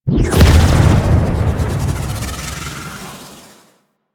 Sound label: Tornado Spawn; Warframe; Corpus; Arachnoid Camper Terra; Abilities